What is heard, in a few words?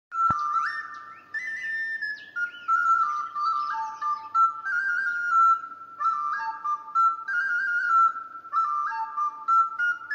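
zvuk volynskoyi sopilky, Volyn sopilka sound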